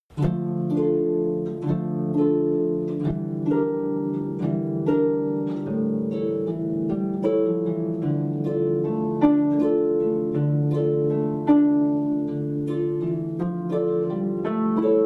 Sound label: Two Guitars, Harp